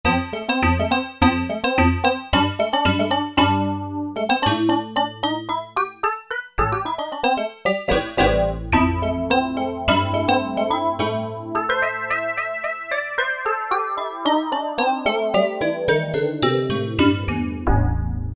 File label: D C O Wave 2, Roland MKS-70